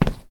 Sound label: Tile long